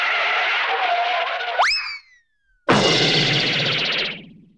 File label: Break trip fall; Fanfare; 1997 MMX LAN PACK (win 95); Worms Armageddon